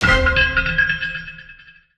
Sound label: Coin String; godsoffortune